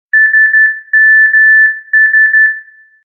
sms morse code; ringtones

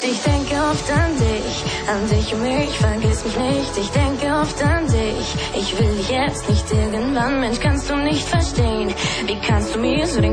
Jazz

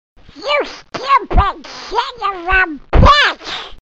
Angry Duck

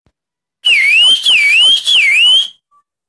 whistle

